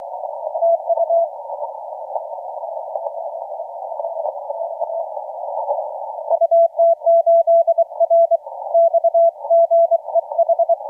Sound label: 20m; TA4